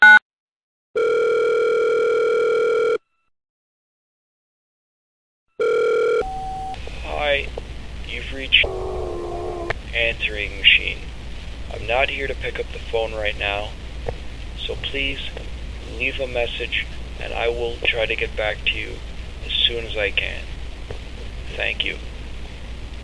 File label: answering machine test